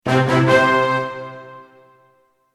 game begin; Casualino; Spades

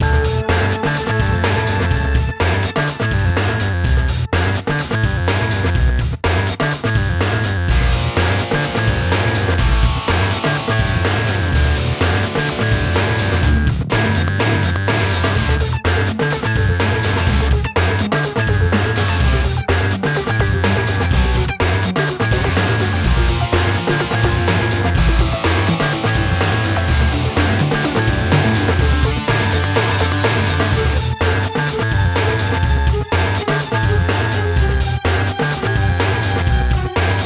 music game; spider-man-mysterio-rush-game; queens

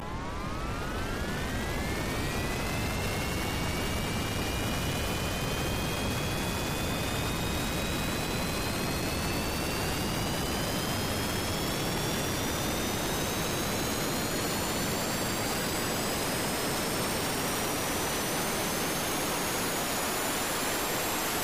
car turbo burn; lightning speed

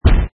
poison shot; inlogic-castledefense

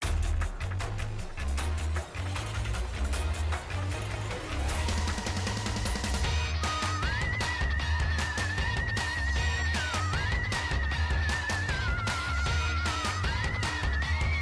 music main full loop quiet; ben 10 steam camp

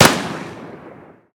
shot; soldiersfury

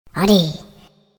connect; monstergo; pokebot

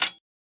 pickup vest; soldiersfury

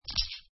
chips, blackjack; inlogic-blackjackmaster

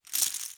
chip pile; Casualino; Blackjack lucky

